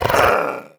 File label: run grunt, headcrab reviver